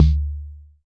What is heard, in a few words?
Jomox MBrane, drum machines; Jomox MBrane 11